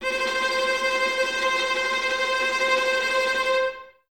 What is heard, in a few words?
Violas Tremolo C 4, String Ensembles; Miroslav Vitous